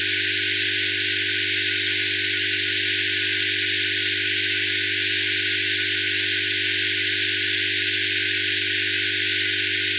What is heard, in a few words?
noisy msg